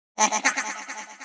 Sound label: Sorcerer, Laugh